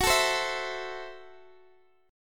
F sharp Minor 7th Flat 5th, Guitar Open G; chordshape; down

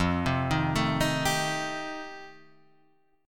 F 9th F Guitar Half Step Down, chord

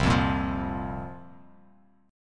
C 7th Suspended 4th, chord; C7sus4 strummed

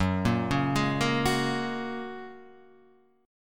F sharp Major Add 9th, Guitar Half Step Down

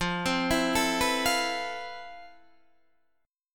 F Major Sharp 11th Guitar DADGAD, chord

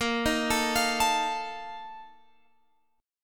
A sharp Major 7th Suspended 4th, Guitar, Open G

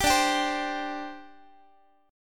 D 7th Suspended 4th, down, Guitar; D7sus4; strummed; 22,22,22,22,x,x

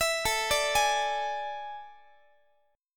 A Major 7th Suspended 4th A, Guitar; AM7sus4; arpeggiated; x,x,19,19,18,19

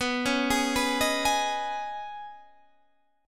C sharp 7th Suspended 2nd B

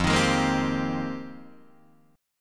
F Minor 11th, Guitar DADGAD

